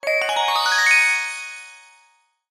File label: fantasy magic glissando ascend bright magical; zapsplat